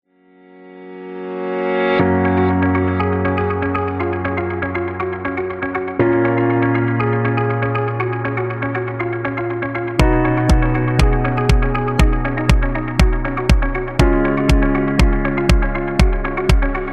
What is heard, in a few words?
High Tech